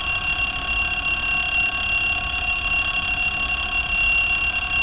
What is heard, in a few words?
alarm bells, ambience; caged